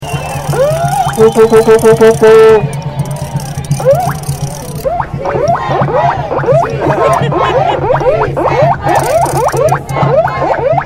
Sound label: funky lil bullhorn